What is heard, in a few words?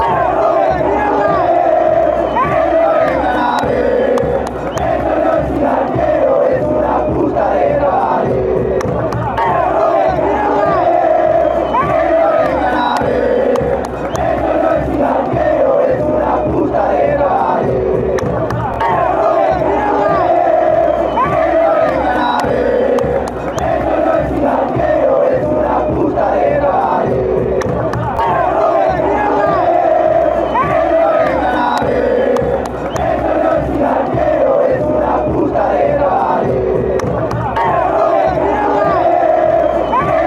club olimpia chant, Paraguay